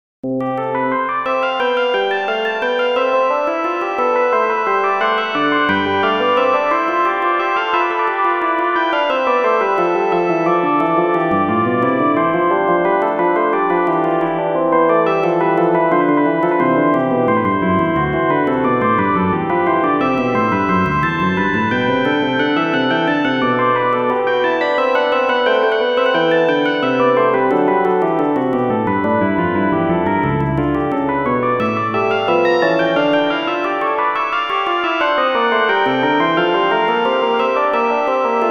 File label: Bach sinfonia big organ; Sinfonia1